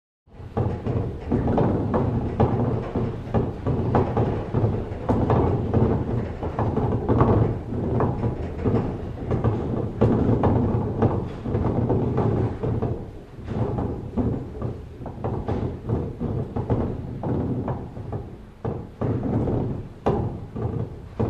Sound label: Woods being transported on a conveyor